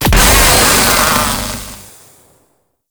Vaults Drone Destroy; Entrati

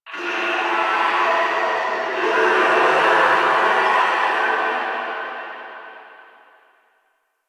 Distant Sewer Grate Screams; Infested; Warframe; Ambience; Nineteen Ninety Nine

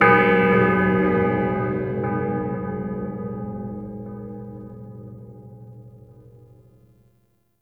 Amphitheatre Bell; Warframe; Duviri; Ambience

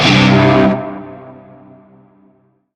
Shawzin Chromatic Chord; Warframe; Narmer Shawzin

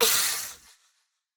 Pain; Warframe; Zariman; Skitter Girl

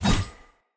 Dagger Whoosh Heavy; Weapons; Player; Swords; Fey

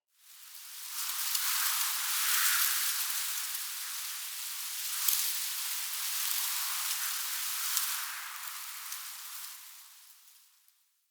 Tree Pine Wind Leaves Relaxed Gust; Trees; Midrath Island; Ambience

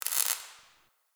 Destruction Electricity Sparks; Corpus Railjack

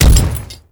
Ghoul Devourer Step Default, Footsteps; Warframe; Grineer Ghouls

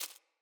Rat Step, Animals Ambient Foley

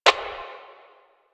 Static; Warframe; Lasrian; Lasrian Enemy A

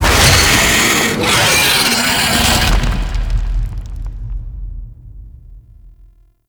Golden Maw Player Kill; Warframe; The War Within

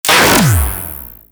Charge Gun Shot; Warframe; Corpus

